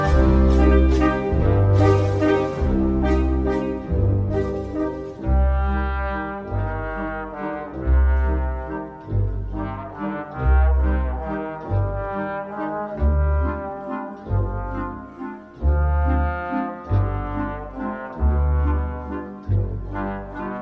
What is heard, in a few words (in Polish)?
waltz no 2 solo puzon